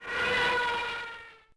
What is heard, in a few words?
Plane Dive; Civ4 warlords